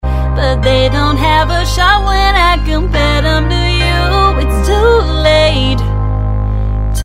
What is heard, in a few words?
B Vox